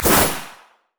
shadowswing; zed shadow

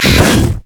dragon basic attack, monsters; LoL

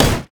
ancient golem basic attack; monsters; LoL

